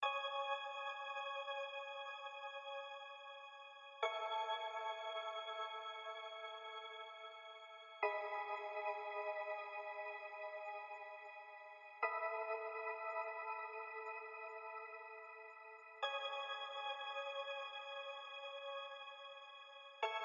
Piano

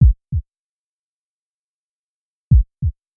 Sting Heartbeat; Brand X Music; Brand X Music Catalogue - Editor's Essentials

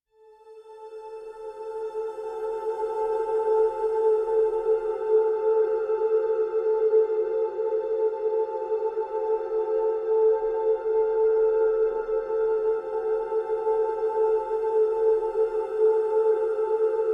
Whispering (A); Brand X Music; Brand X Music Catalogue - Editor's Essentials

